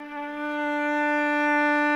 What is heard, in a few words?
trumpet violin